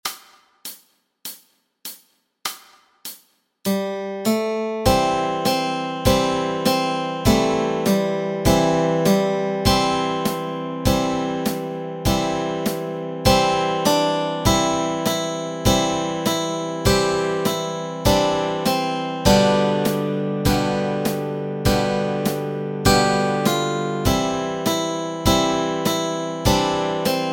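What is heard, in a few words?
melody and chords, Easy Guitar and Ukulele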